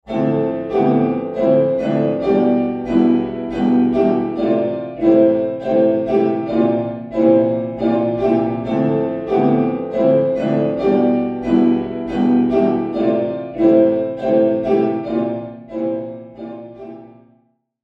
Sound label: She's Playing Piano; Undertale OST